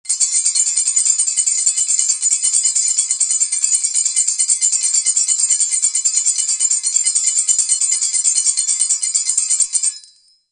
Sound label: Sistrum solo